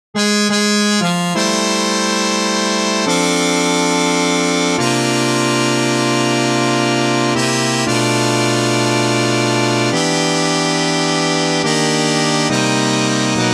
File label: All Parts, barbershop tag